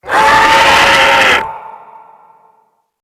screecher

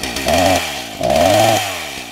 chainsaw spinup

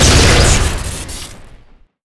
spark shotgun shoot crit

